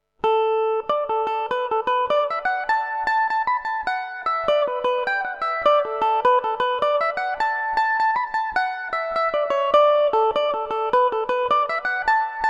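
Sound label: jig D major revelation, irish mandolin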